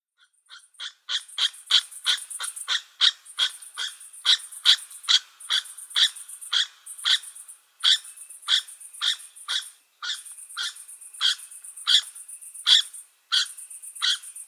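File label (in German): Siebenschläfer Glis glis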